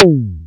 drum g2, DRUMCOMPUTER YAMAHA R5+RX7; Best Service ProSamples vol.55; Retro Sampler

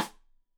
Side Stick Stereo; Deeply Sampled Drums; SMDrum

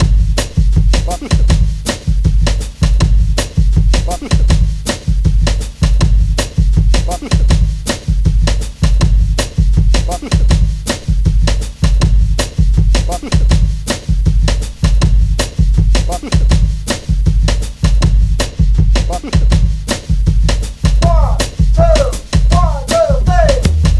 15 HEAVY METAL BOSSA 80 bpm, Loops Breaks; POTBreaks

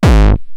Roland TR-909 BD; BD Selection

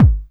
KICK, BASSDRUM, Techno 138 BPM; Best Service ProSamples vol.54; AKAI

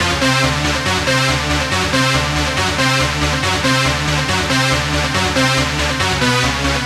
Techno Synth

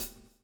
swing

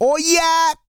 REGGAE, Vocal Planet World Voices

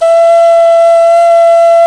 D OCRNA, CLAYFLUTE

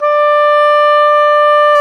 oboe; Roland LCDP04 Orchestral Winds; Oboe Brth 1

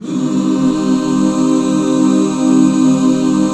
C MIN, Back Chords; Sound & Vision - Gigapack II CD 1

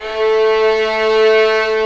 STR VIO; AKAI S-Series CD-ROM Sound Library VOL-2

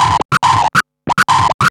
Scratch Fills 140BPM

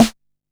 Snare, Drum & Bass; AMG - Prototype Drum & Bass; Snares

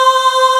vox C4; E-MU Formula 4000 Series Vol. 5; Protozoa; Proteus1 Presets

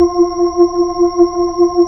B-3 Chorus

